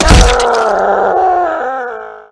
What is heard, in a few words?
zombie soldier attack